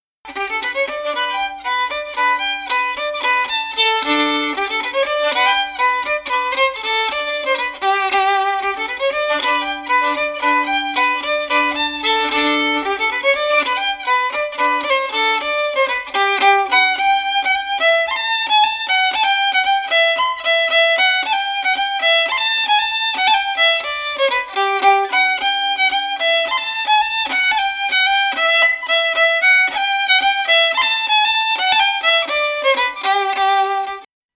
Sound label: fiddle; duke of perth; SeamusGrantFestival